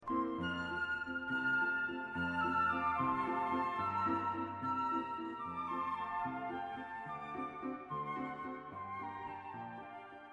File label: Shostakovitch Waltz 2